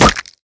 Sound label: Snowball hit